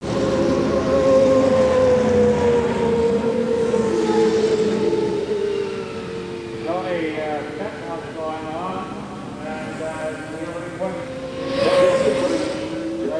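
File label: indy, racing; WAV Sound Effects; sports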